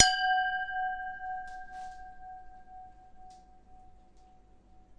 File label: glass camerton ish, Crystal Glass Strikes